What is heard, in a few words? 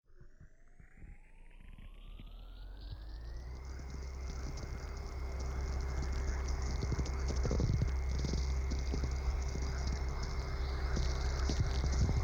Lo. Synth. Dist. (Inner Space